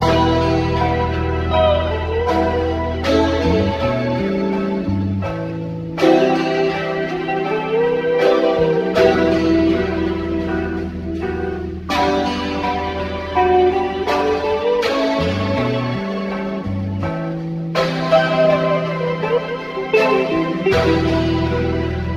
guitar, Sad

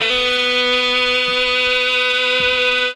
wailing, Guitar; Notes

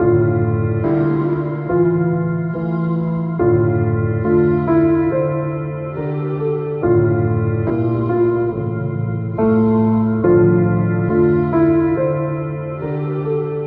piano and keys, Loops, Sad

